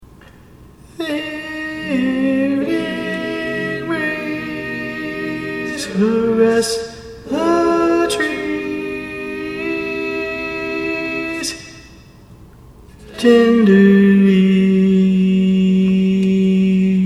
Tenor

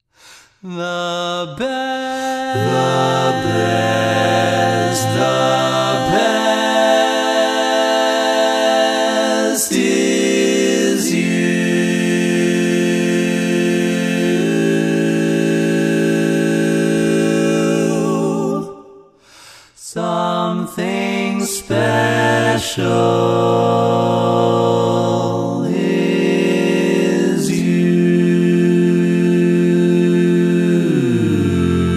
barbershop tags, all parts

